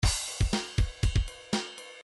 rock, midi

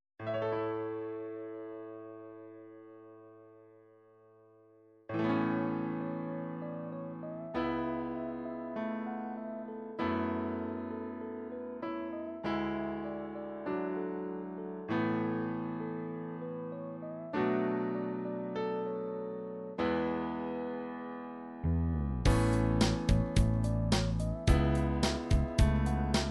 rain, midi

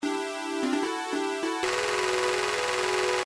battle won fanfare, midi; gameboy sword of hope

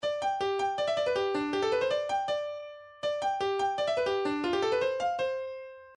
piccolo, midi

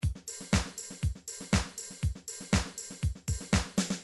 midi drums, euro

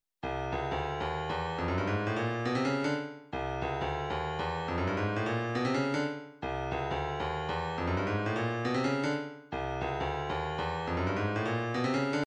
155 snare

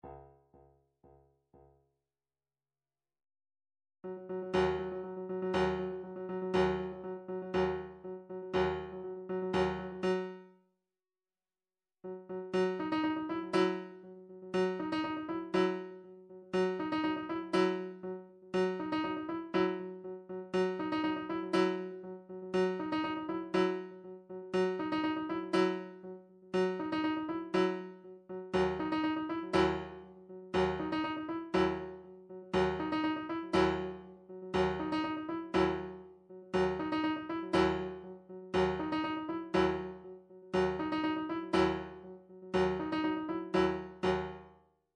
drum trax per

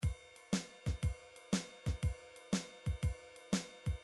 drums, midi drums

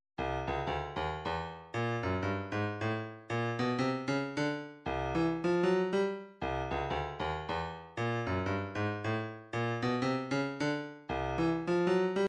154 snare

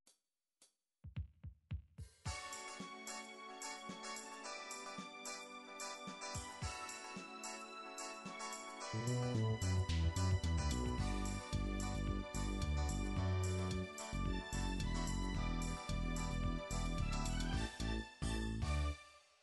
disco

